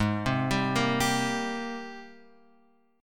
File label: G sharp Major 9th G sharp, Guitar Open F; G#M9 arpeggiated; JGuitar